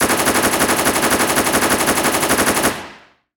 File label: machine gun fire; soundscrate